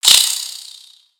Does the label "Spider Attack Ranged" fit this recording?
Yes